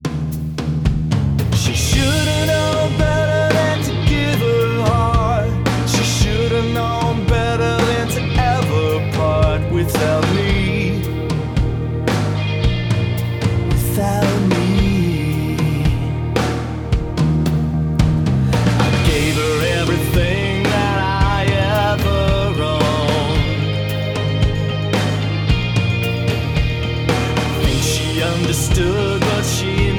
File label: rock 80s big open, drums